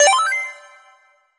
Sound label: game success alert; mixkit